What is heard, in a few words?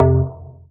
DM BAS, Dance Mega Synth